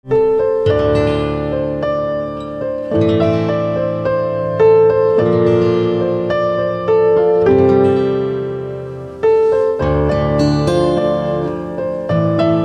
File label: Piano